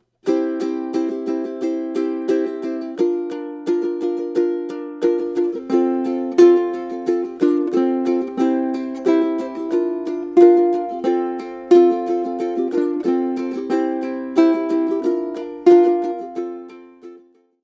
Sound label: Carrot Break, ukulele